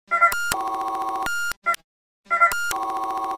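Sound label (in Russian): синтезаторный звук напоминающий звук принтера или электронного девайса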